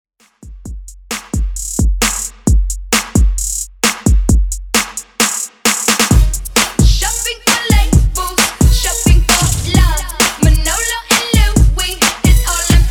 Throwback Hiphop; Redrum

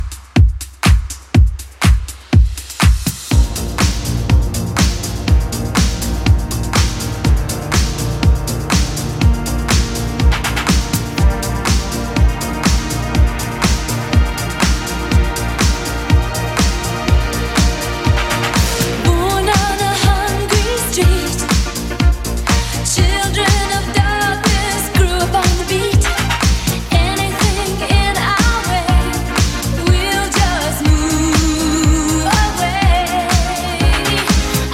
80s Club Edit 122bpm

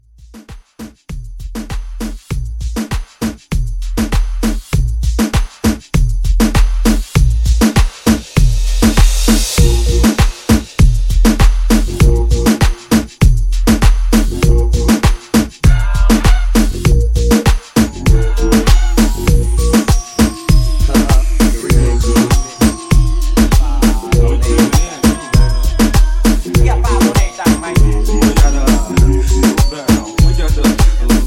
Moombah Re Drum

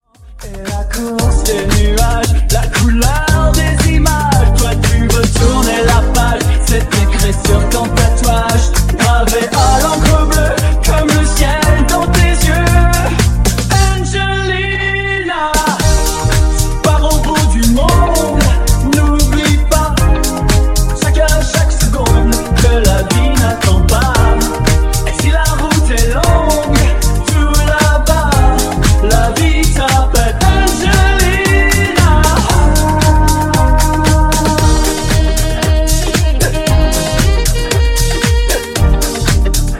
Funk Nu Disko 115Bpm

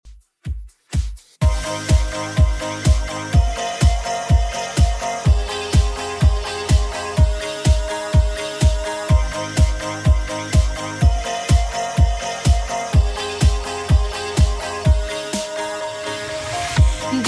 2ks Redrum 125bpm

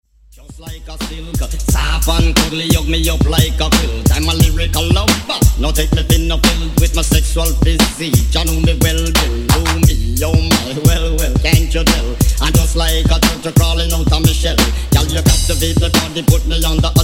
Wordplay 88 106 Bpm

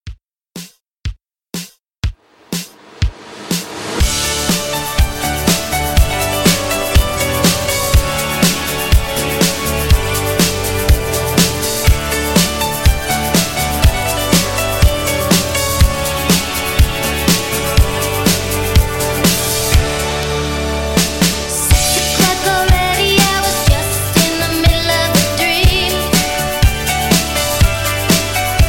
80s Alternative Re Drum 122

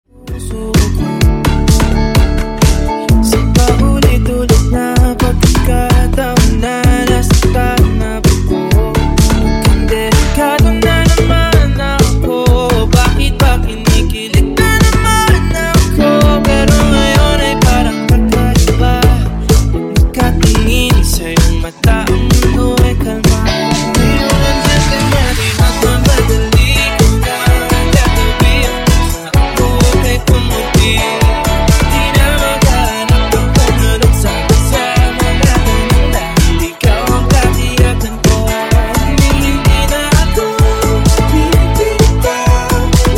Funk Dope Banger Clean 128 Bpm

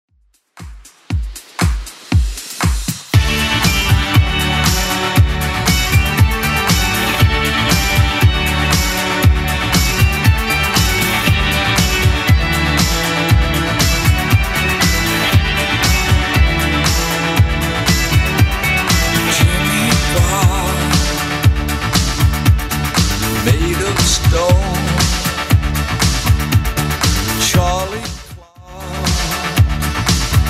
80s Club Edit 118 bpm

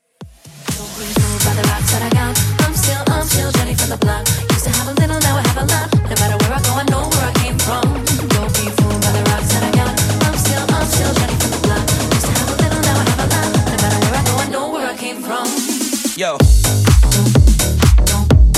House Redrum 126bpm; Bootleg

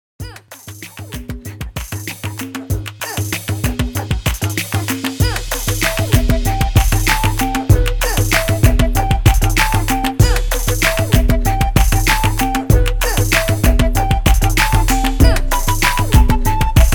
OLD SCHOOL 96 BPM; REDRUM

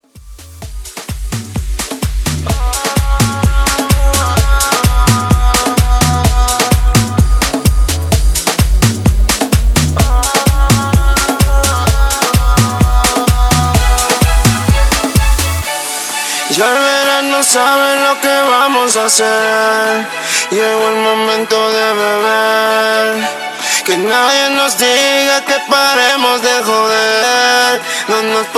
Club Dance Re Drum 128 BPM; Clean In Outro

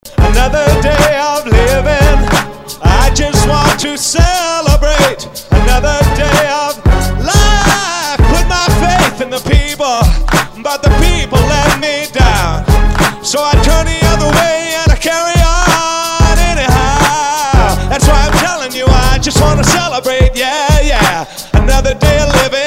Classic Throwback Mash Up

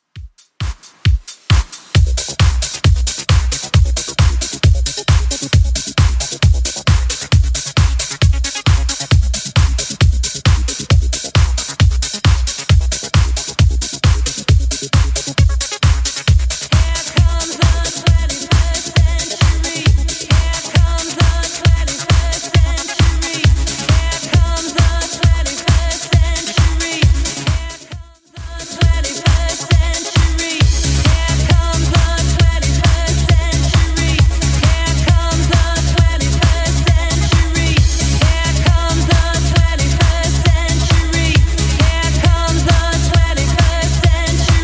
80s Redrum 134bpm

